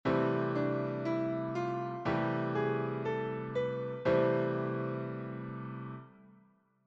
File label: bebop; Gamme Majeure; Gamme Majeure et Modes Associés